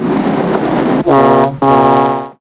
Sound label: horn